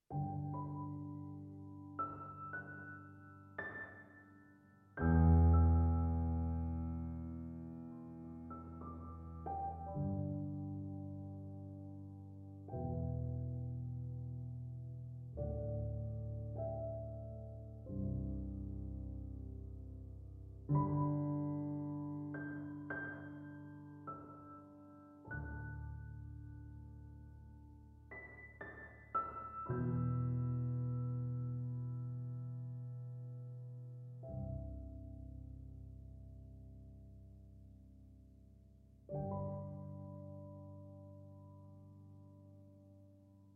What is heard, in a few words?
Piano; Tired Ghosts